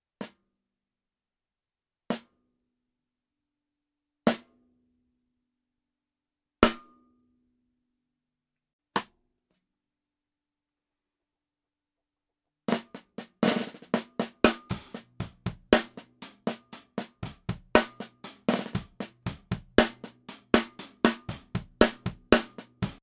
TAMA LAL145 hi

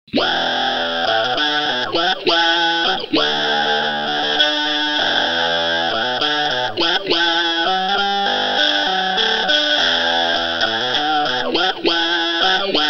British High Gain Talk Box

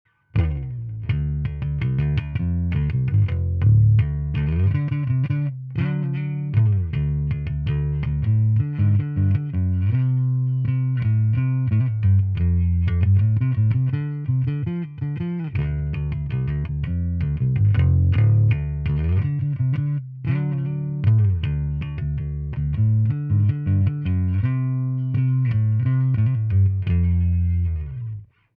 Preci Neutral Rock

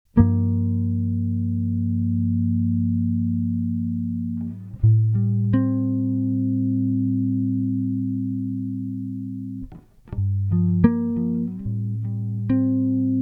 Walrus Audio Badwater Chord EQ Compressor

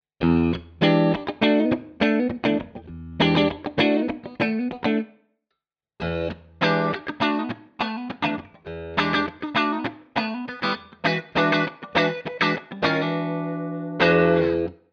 Epiphone Les Paul Both Split Out of Phase

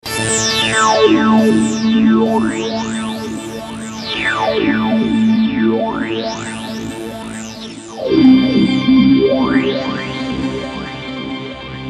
Mooer GE 300

